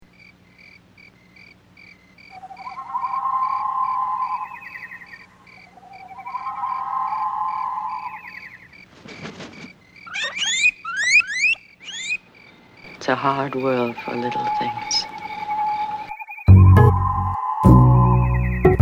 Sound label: Double Bass